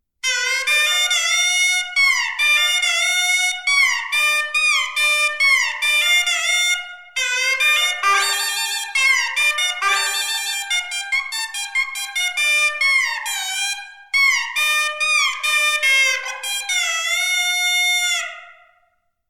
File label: Suona